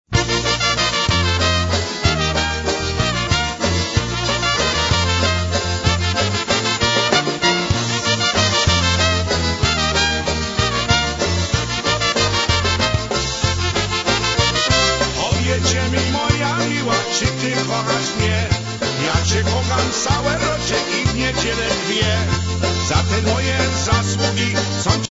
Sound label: oberek